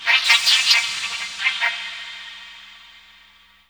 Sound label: SPACE NOID, DANGER SUSP; Spectrasonic Distorted Reality 1